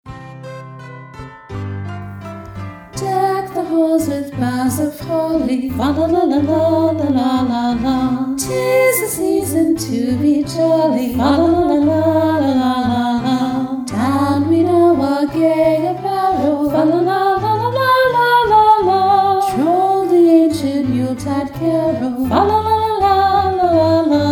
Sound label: Deck The Halls VOCAL